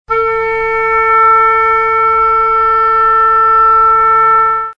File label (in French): hautbois; Blues